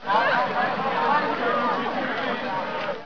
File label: market; Caesar3